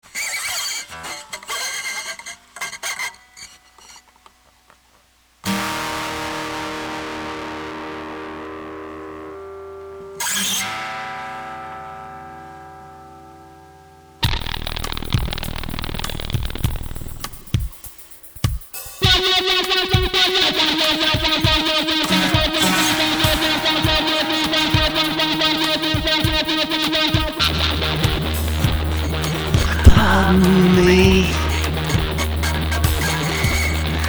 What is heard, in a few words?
Distortion Level